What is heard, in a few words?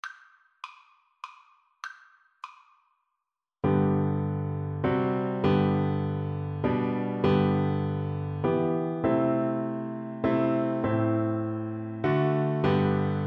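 recorder karaoke; away in a manger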